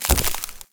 Crack; candy crush saga gamerip

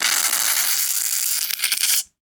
wet suction

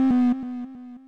Oneshot Jumpblox Fail

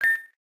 Choose

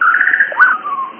Birds; Buggy; PS1

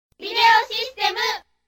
Logo; Video System!

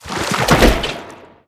Key open

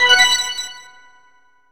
Silver Coin; Diddy Kong Racing; Nintendo 64

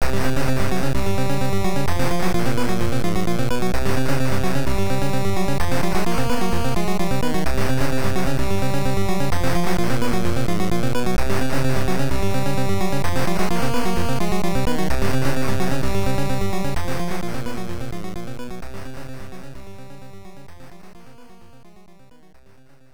Boss Fight; Willow; NES

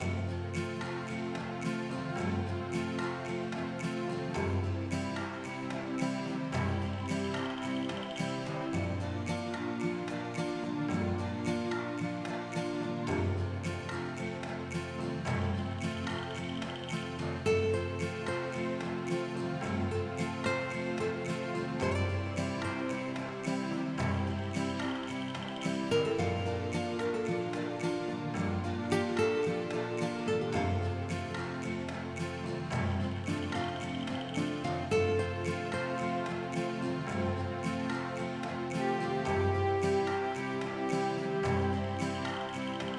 Stables; barbie horse adventures mystery ride

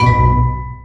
Fizz Chain; Candy Crush Soda

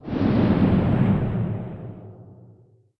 Dark

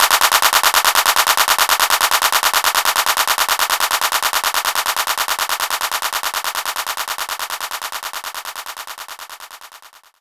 TR 808 Clap; knights try

